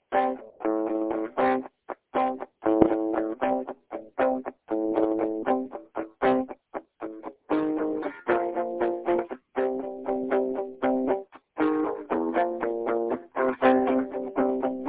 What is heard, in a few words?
6b 7b 1 progression